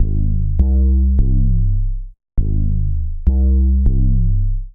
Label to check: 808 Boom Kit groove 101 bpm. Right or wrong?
Right